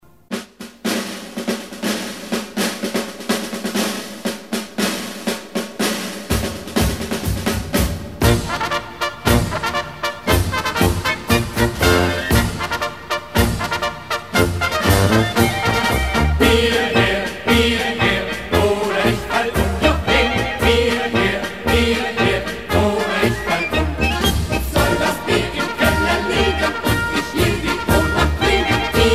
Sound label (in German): Marsch